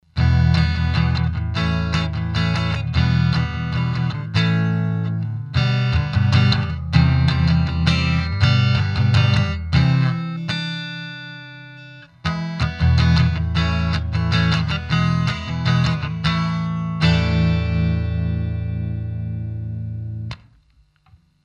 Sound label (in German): E Gtr clean Kick on Boost off; Telefunken M82